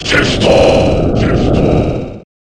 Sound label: Microphone Scream; Kirby Super Star